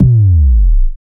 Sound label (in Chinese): 慢动作-时间冻结